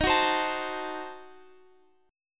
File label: Eb Diminished 7th, Guitar Open F; Ebdim7 strummed